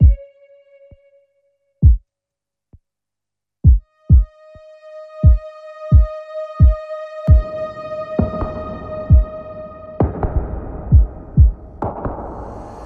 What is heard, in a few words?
Pitch Phat Beat, Rhythm